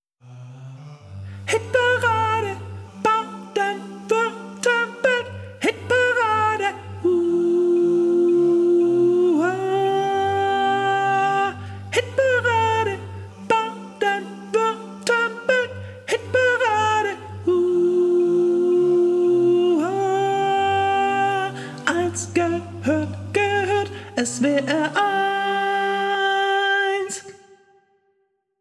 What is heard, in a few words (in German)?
Jingle Sopran; SWR1 Hitparade